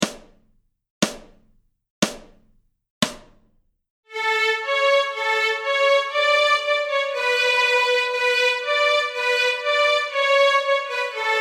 Polkalåt Stråk A, Fiol

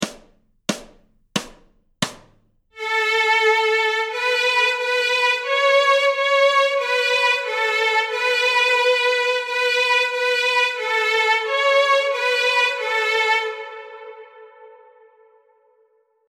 Stråk A, Fiol; Plankan; Notträning

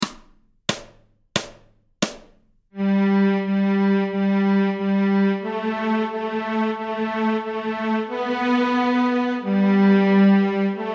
Minilåtar Stråk G, Fiol

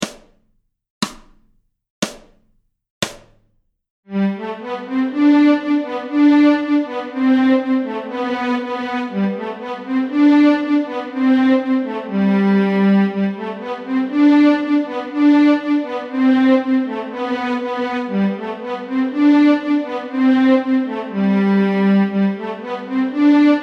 Polkalåt Stråk G, nyckelharpa

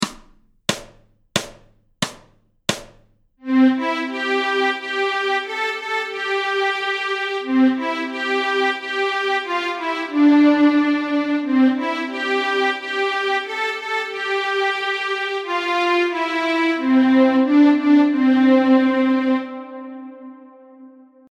Stråk C, Fiol; Kumbayah

